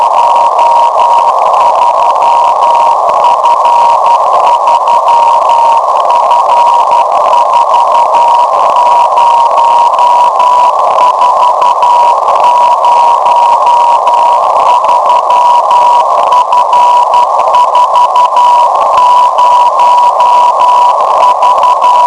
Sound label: prism cw beacon; The CW beacon signal was recorded on January 30th 2009 at 01:03 UTC; prism jq1yzw; kindly provided by Paulo CT1ETE